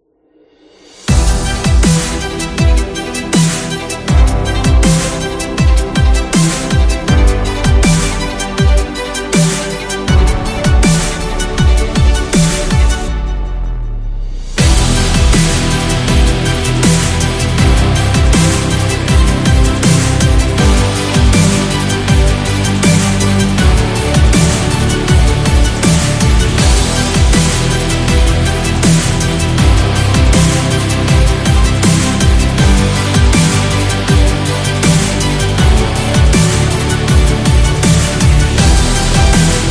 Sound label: An Epic Dubstep; epic music vol 4